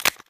adrenaline cap off; weapons; source engine; left4dead2

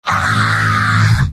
heavy battle cry; mvm

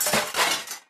item metal scrap pickup

